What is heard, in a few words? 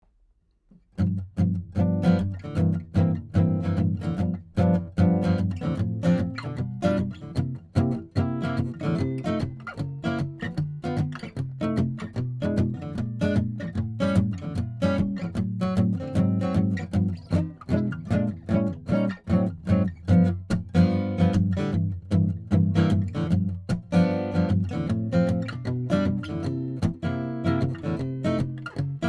D15 Ocean Jazz; Jazz Blues; Jazz standard, in G; Martin D15